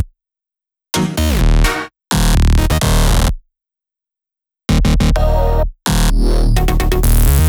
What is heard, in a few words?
Dynamite Melody Root D#, 128BPM; Vengeance Samples; Dynamite Kit